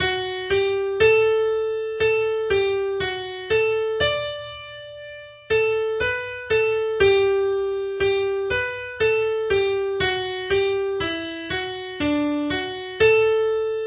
slow Mazurka; Sonny Brogans Mazurka

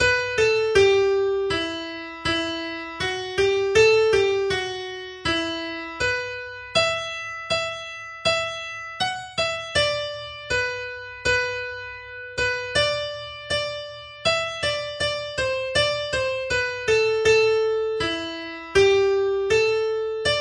slow; Sheriffs Ride

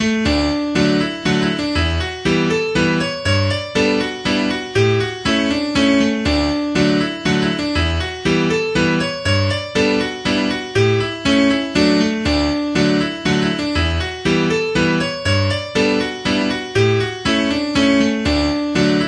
Mazurka; May Mazurka

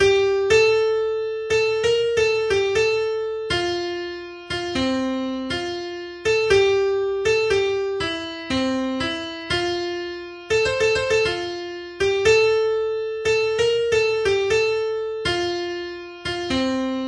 slow Polska; Polska Fran Lovstabruk

